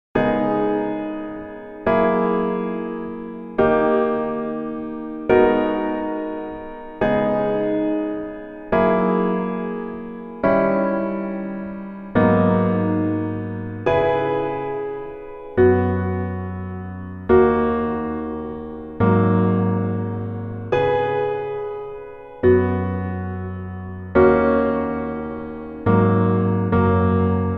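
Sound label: Piano version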